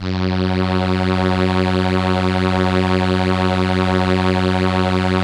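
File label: ARP STR ENSL ensemble F#, Vintage Keyboards; Big Fish Audio - First Call Vintage Keyboards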